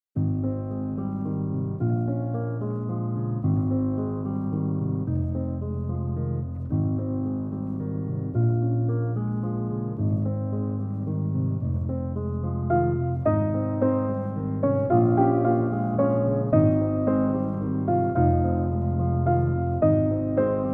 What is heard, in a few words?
Piano